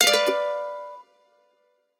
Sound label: multiline win; Hot Party Deluxe; Wazdan